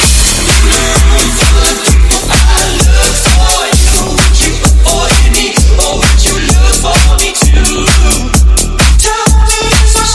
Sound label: 130bpm Em